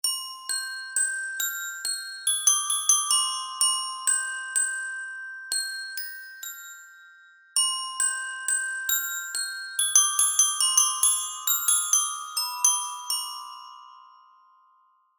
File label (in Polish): Dzwonki orkiestrowe